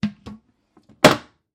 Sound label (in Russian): zvuki sidenia dlia unitaza